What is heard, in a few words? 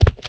Footstep, Ice Beast